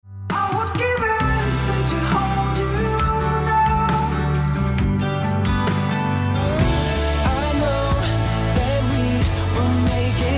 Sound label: Acoustic Dream